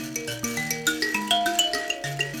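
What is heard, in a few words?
grand mbira phrase, African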